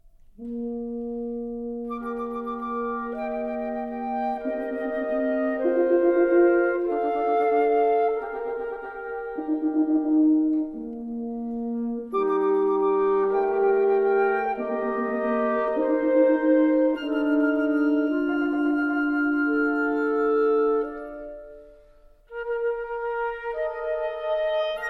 Partita For Woodwind Quintet, Coda

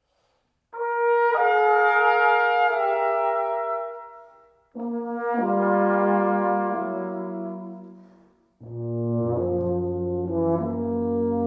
Brass Quintet In D Flat Major Andantino

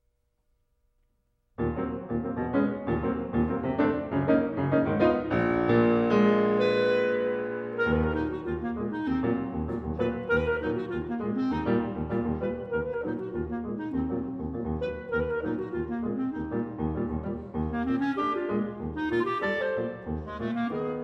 Piano - Andante ben ritmato e diciso

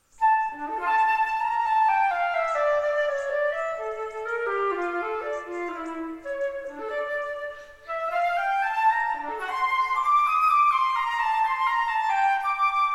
Suite in D Minor, Allemande; Suite No. 2; BWV 1008

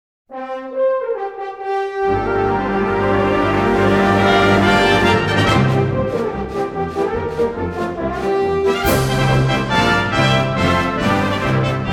American Overture For Band

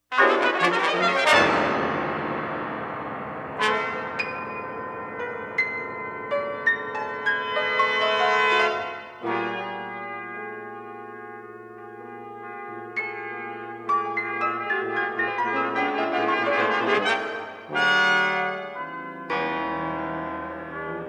Brass Quintet and Piano

